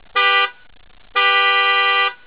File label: proud horn